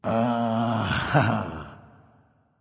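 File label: nonverbal agree; smite; voicepack; ullr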